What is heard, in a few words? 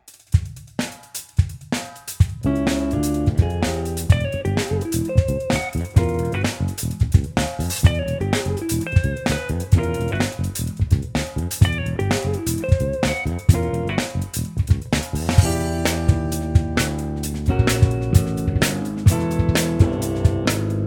Forest Funk